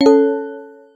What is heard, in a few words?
badge notification